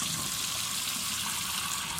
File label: water flush, Bathroom